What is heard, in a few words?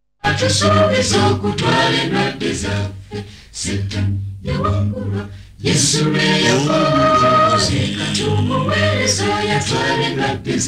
Choir